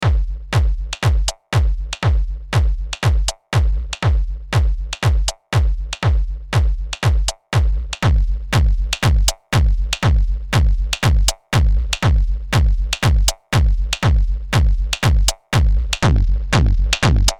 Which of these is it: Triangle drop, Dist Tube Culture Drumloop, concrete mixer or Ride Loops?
Dist Tube Culture Drumloop